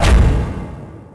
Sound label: hit; RYL 2 v1673